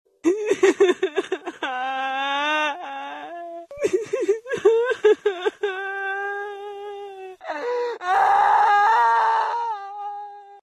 cry

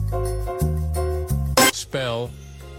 yeeee spell

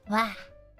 wah

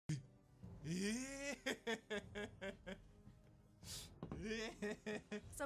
bad laugh

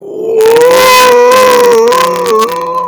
nerd roar

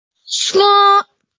nyaaaaaaaaaa

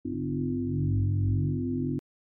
reactor sound

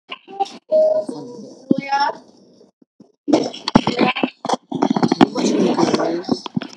augh

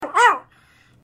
dog; source

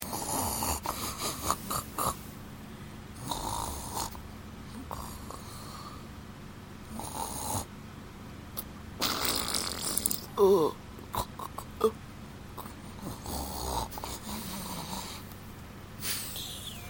snoore

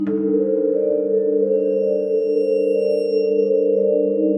creepy

